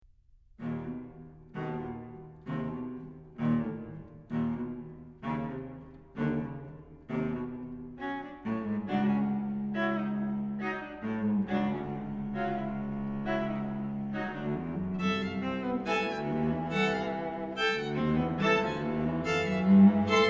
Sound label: String Quartet; String Quartet No. 2